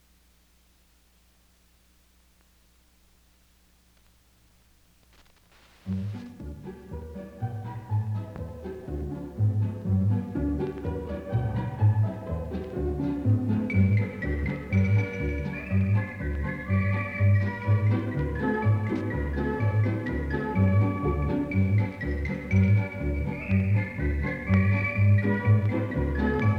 Whistle

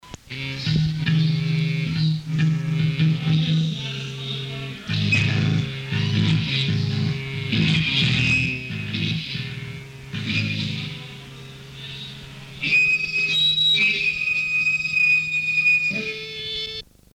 Screamery Outro

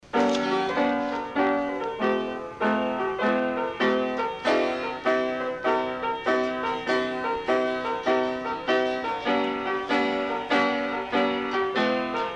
Piano

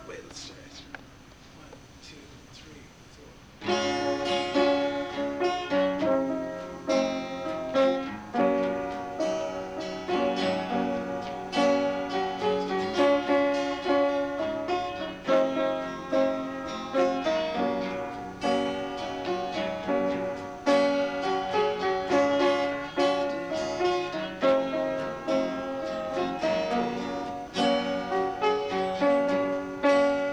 Piano Cuts Off

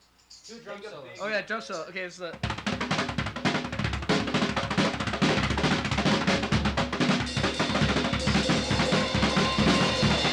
Drum Solo; Background Actors

